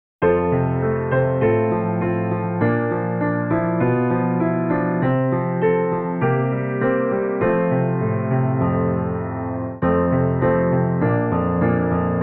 School Song, Piano Accompaniment; New LovePlus; Towano High School

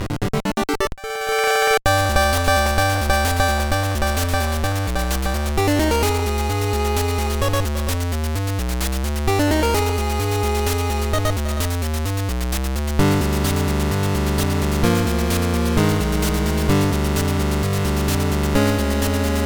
Battle; Mother 1+2; GBA

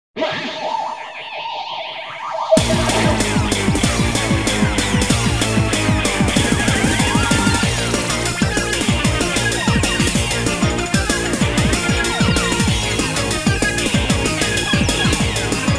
tom-tom attack; Blinx the Time Sweeper; Xbox

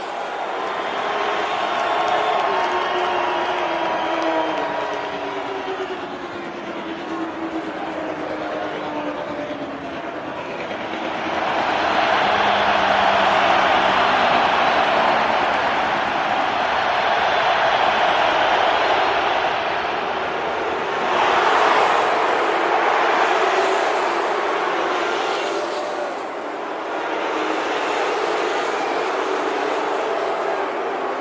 Ambience Mos Espa Arena; Angry Birds Star Wars 2

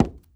run wood

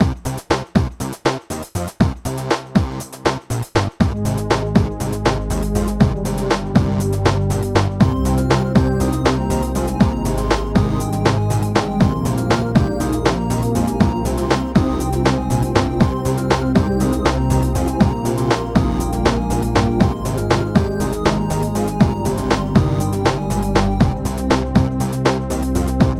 Pause Menu

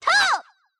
slash; Mystical Ninja Starring Goemon

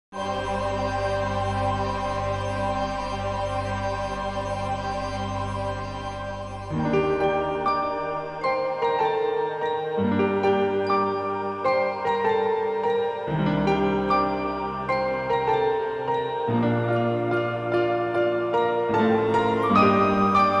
Instrumental Version; Kingdom Hearts HD 1.5 2.5 Remix

